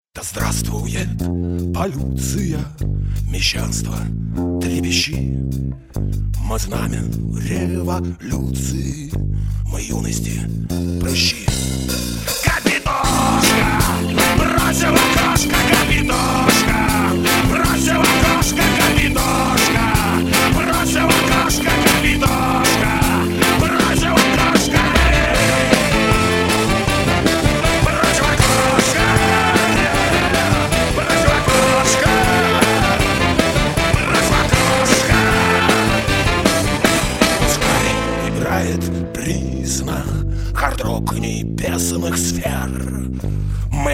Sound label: bpm150